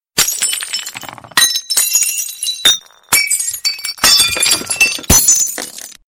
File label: diamond crash